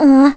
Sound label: Uhh; Prince Komali; Zelda